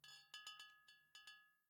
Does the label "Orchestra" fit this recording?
No